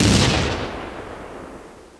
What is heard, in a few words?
explode; bomberclone